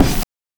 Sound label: BD + CRASH; NES DPCM; MIDINES